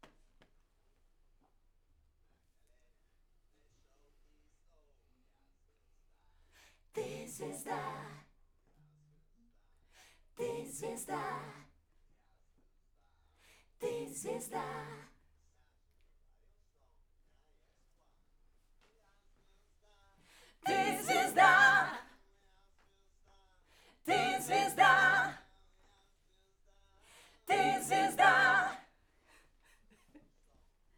Backs; SCHOEPS

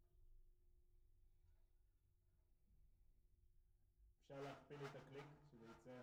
SNR Bot

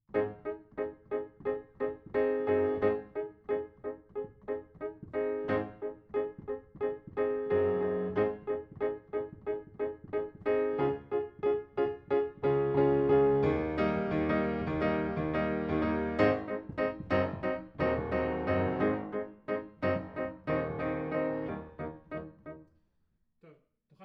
Piano A

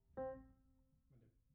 Piano Mono; Piano Workshop Rec

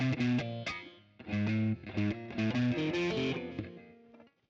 Guitar

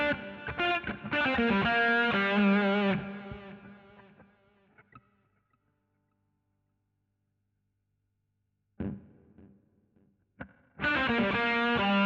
GTR B Solo

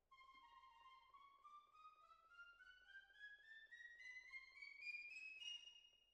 Cellos

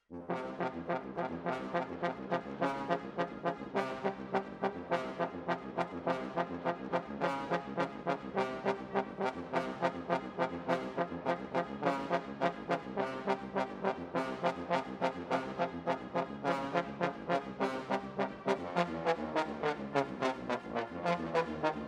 Trombones, jazz for piano

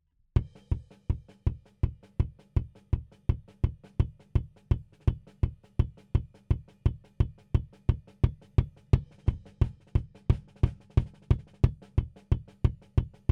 Kick In

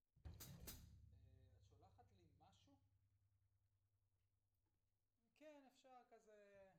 Floor

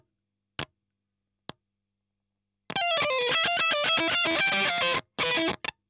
EL Fuzz End

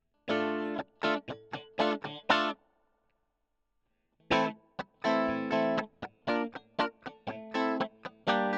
E GTR; Gefell

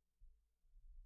Kick In

